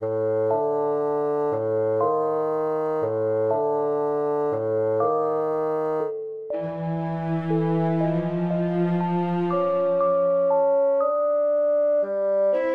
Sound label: Quirky Atmosphere